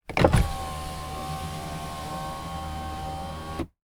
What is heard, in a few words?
Car Window Rolling Down; 玻璃下降